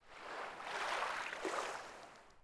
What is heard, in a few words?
Oasis Wave; Ambient; Civ4